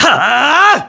Smash Up; Link's upward smash attack shout

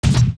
Fall Down Dirt; Link getting knocked down onto various surfaces

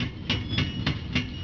Spiked Log; Deku Tree; Zelda; OOT

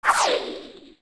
sword spin; Spin-attack with a sword

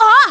"Uh"; Talo: "Uh..!" (seeing a Monkey)

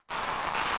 Web Stretch; Deku Tree; zelda; OOT

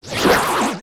Deku Baba Die; zelda; OOT

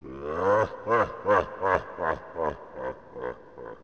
Laugh; Phantom Ganon; OOT

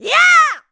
Power Attack; Zelda

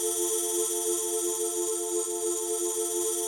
Great Fairy Gift Loop; Zelda; OOT

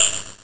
Element Sparkle; Elements sparkling while glowing

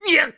Lift Heavy; Skyward Sword; zelda

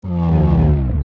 Hit; ReDead

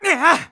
Throw Heavy; Link throwing a heavy object

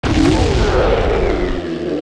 Die; Barinade; zelda; OOT

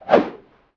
Link Roll; Link rolling (also doing a backflip)

